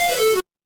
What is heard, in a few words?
retro game game over